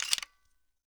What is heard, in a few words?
m3s90 load; garrysmod; m3s90p